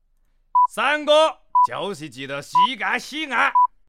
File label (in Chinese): 广西方言 男 山歌; 方言配音